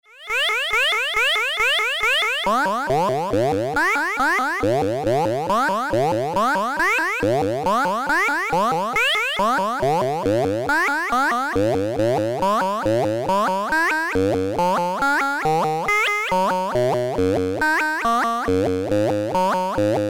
sync vox; Quad lfo

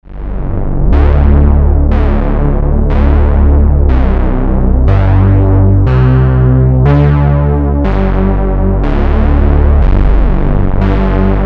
animated bass; TriVCO

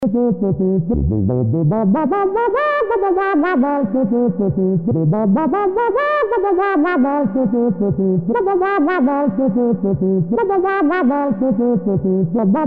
wonky

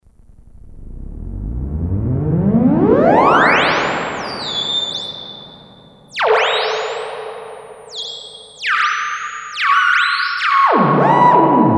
reverb

